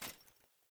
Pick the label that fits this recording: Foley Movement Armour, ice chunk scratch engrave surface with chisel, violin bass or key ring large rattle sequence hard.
Foley Movement Armour